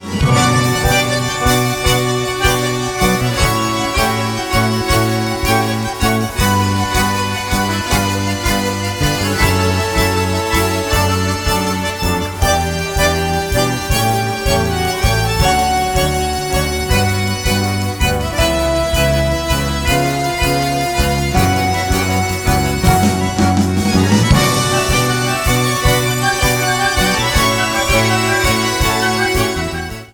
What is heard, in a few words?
Tango, accordeon